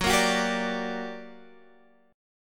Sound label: G Minor Major 7th, Gb, guitar chord